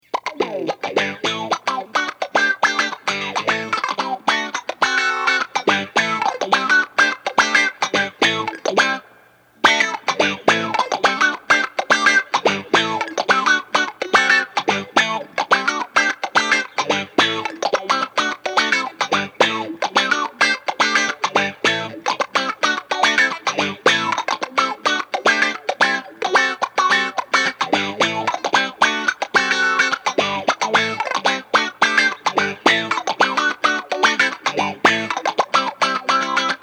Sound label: Blue Wah Rocker Groove